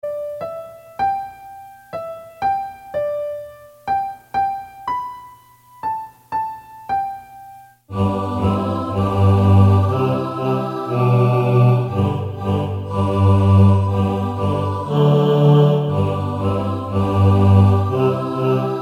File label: BASS